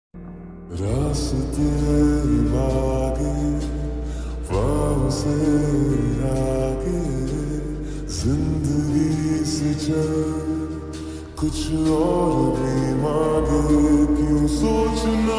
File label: slowed and reverb; ms dhoni; roh sound world